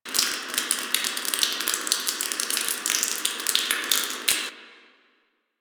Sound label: Shipyards Water Drip Loop; Warframe; Grineer Shipyards